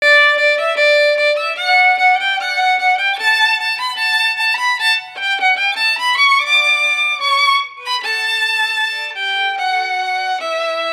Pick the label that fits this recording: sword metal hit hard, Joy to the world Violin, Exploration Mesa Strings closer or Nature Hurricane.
Joy to the world Violin